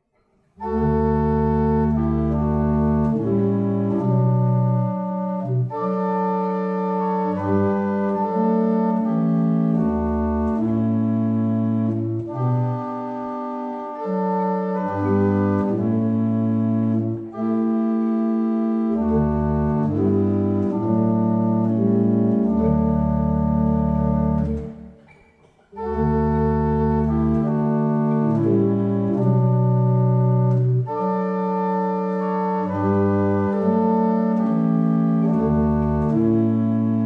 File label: Double chant, barrel organs; Staunton